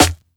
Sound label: raw steel snare drum f key; snare drum samples